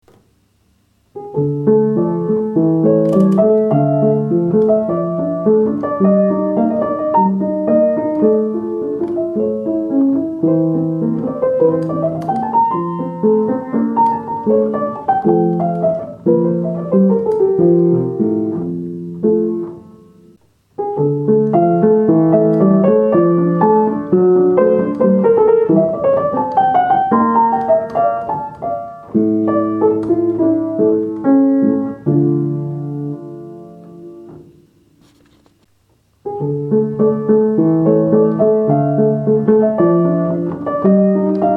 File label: verset per organo; venti versetti